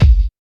tight bass drum sample g key, Royality free kick single shot tuned to the G note.